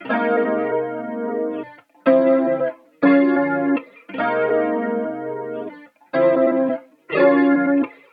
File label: guitar; Crowdsourced; TRUTH-HZ; Crowdsourced Sample Pack